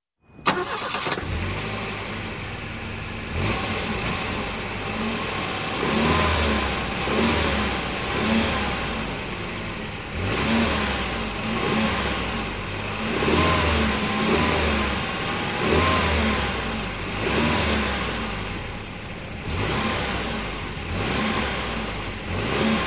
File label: Car engine ignition and running